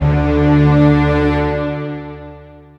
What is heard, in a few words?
Big strings D2